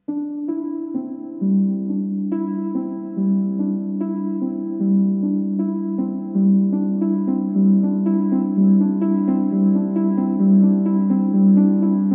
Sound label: Classical Music Ringtones